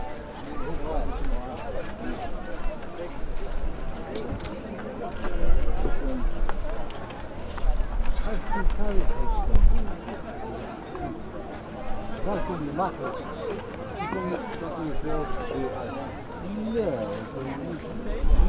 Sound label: Market